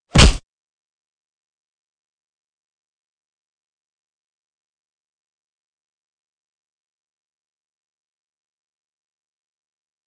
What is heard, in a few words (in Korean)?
Fights, 싸움-전투; 헐리웃특수효과음